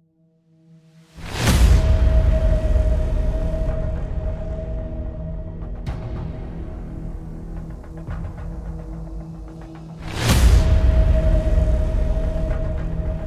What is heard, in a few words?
Epic Music instrumental